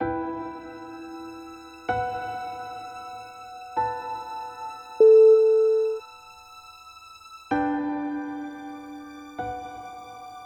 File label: slow emotions